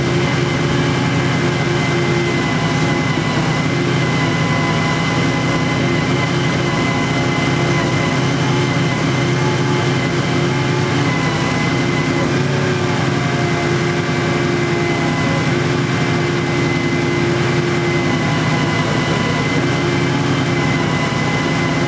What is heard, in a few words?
machine, generators; gmod